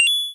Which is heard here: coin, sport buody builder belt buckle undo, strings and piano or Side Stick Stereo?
coin